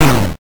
retro die, synth

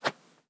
Classic Swish, organic wooshes; Micro Pack

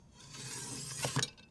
Big Knife Sheath; Micro Pack

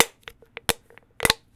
Small Can Crush; Micro Pack

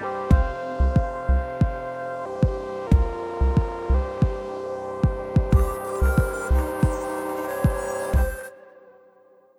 Electronic Flute; Solos

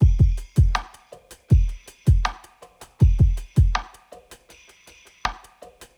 Flowing Loop

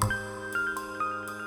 Tongue Click, Drums; BB 2HTC Samples Vol 4

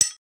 hit; breaking falling hit sfx

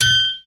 Bell

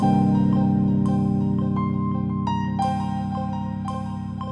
Pads; BB 2HTC Samples Vol 4

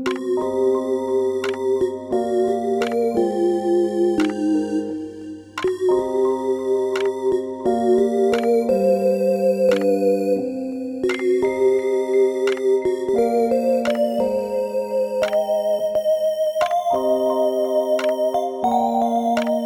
Synth Solo; Solos

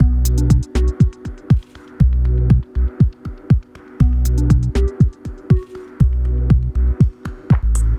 Driving, Loops

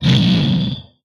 Explosion, retro arcade; MMRetroArcadeSoundsPack

